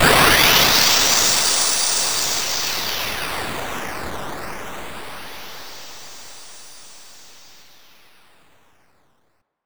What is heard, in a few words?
FX Downlifter